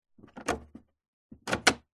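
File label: household dehumidifier plastic reservoir drawer open then close; zapsplat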